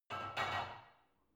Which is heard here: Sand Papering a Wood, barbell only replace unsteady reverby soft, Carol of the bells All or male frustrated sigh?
barbell only replace unsteady reverby soft